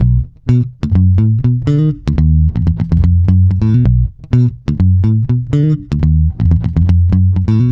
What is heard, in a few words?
BOOG B, Bass, BOOGALOO; Spectrasonics; Bass Legends